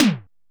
DRM; Best Service ProSamples vol.55 - Retro Sampler; AKAI; EMU SP12+120

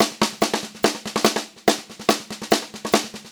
144 SPEED, Pop-Rock Drumloops

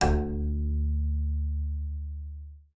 bass, D2; Xsample VOL-5

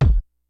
KICKS; Kid Nepro; Elite; KICKS SNARES

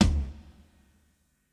Kit, breakcore Drum Hits

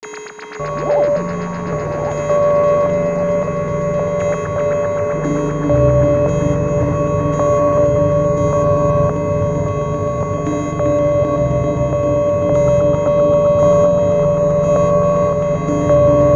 SUBATOMIC FAX MACHINE, STRANGE MACHINE LOOPS

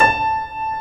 KLAVINS A5; East West - Global Sounds

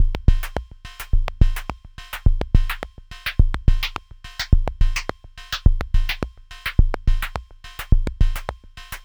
Blue Screen Beat; Ultrabeats

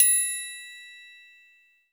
TRIANGLE; Greytsounds VOL-1; ORCH PERC 4

